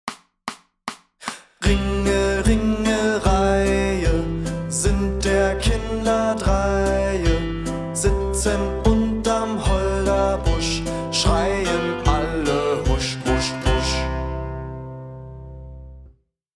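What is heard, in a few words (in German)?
Ringelreihe schnell, Gitarrenschule; Titel 43 – Ringelreihe (schnell)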